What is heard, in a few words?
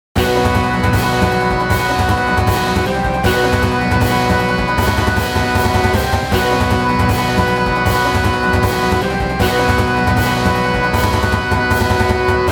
rumble band